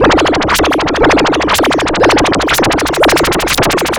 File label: LFO, Noise